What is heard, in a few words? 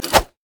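siegerider basic attack; minions; LoL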